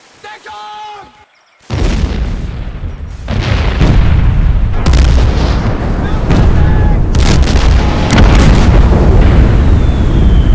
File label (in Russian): artiller